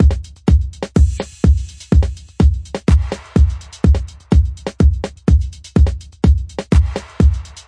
electro oldskool verb claps, drum loops and breaks